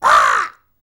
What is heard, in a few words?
TUV BIRD; Spectrasonics Vocal Planet; Jazz FX